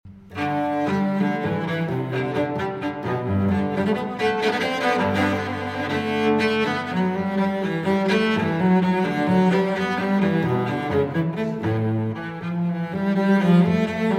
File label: Cello Suite No. 1 in G Major, Gigue; BWV 1007 VI. Gigue